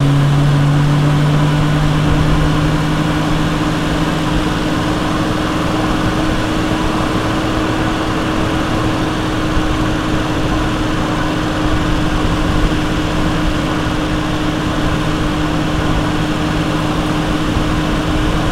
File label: Mustang V8 Car Consta